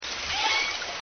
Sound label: Sxx, orca call